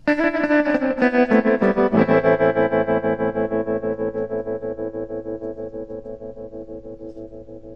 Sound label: tremolo